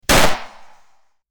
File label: Gunshot pistol